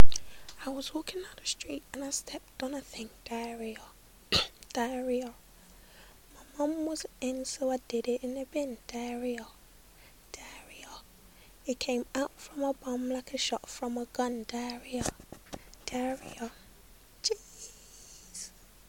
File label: diarrhea song